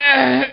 DYING; BattleSNDS

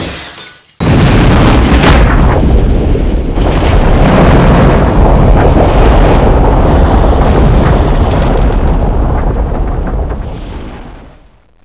molotov; Weapons

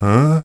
humm; BattleSNDS

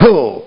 hit; BattleSNDS

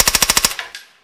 silencer burst

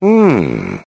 humm; BattleSNDS

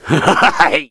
laughter; BattleSNDS

